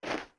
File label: Steps Snow